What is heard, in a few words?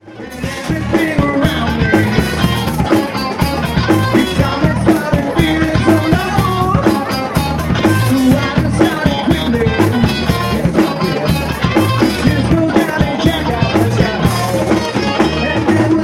funky music